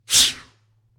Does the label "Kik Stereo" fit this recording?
No